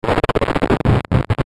Distortion Short Glitch Static Bursts; Blastwave

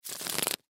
Cards Shuffle; Bridge Poker Playing; Casinos; Sports; Cards (Sound Effects:Casinos); Blastwave

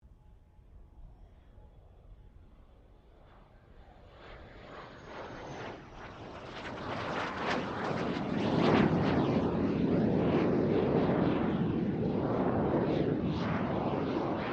AIRCRAFT JET PROVOST T2 PASS; BAC Jet Provost; Airplanes; Vehicles

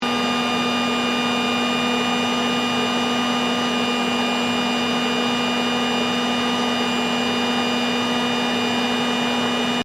Vacuum Cleaner Outdoor Operating Loop; Ryobi; Household Appliances

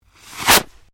Fireworks Skyrocket Launch, Domestic Fireworks; Fireworks (Sound Effects:Backgrounds)

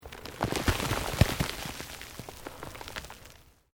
EARTH LOOSE MOVE LONG, Debris

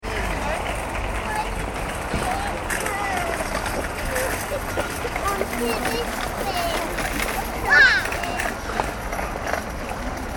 ambience store supermarket car park; Supermarket External Car Park Ambience - Shopping Carts / Trolleys Being Pushed Close - By; Stores and Malls; Backgrounds; McKinney Sound

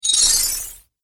Magic Chain Impact

